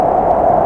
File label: tram; NFS High Stakes